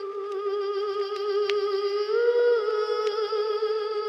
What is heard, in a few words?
voice, Sad